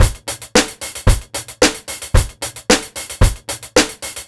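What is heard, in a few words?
BEAT 1, 112 bpm, Funk; USB Soundscan vol.41; AKAI; Roots Funk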